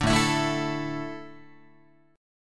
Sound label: A Suspended 4th, Guitar; Asus4; strummed; 5,5,7,7,8,8; 4A,4E,5A,5D,5G#,6C#; JGuitar